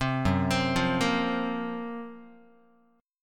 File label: Gb Major 7th Flat 5th Guitar; GbM7b5 arpeggiated; JGuitar